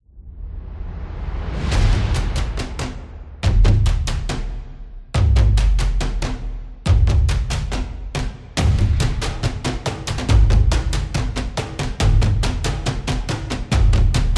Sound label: Tribal Drums Cinematic Trailer